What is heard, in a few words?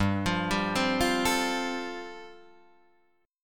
G 9th Suspended 4th, Guitar DADGAD